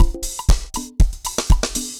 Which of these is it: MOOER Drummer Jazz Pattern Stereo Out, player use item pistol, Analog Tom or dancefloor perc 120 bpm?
dancefloor perc 120 bpm